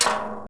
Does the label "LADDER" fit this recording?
Yes